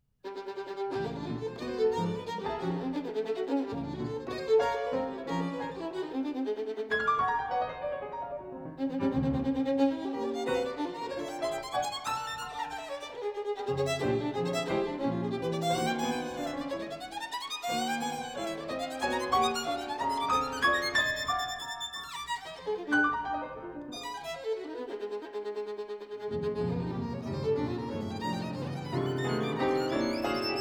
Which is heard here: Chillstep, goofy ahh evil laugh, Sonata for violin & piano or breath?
Sonata for violin & piano